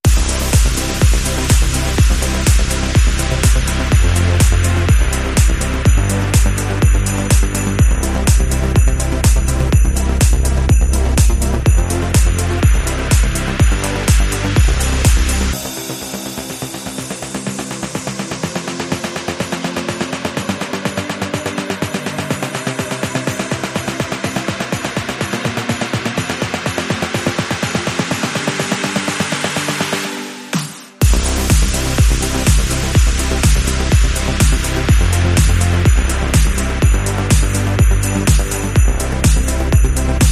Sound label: Big Banger Beats Rhythm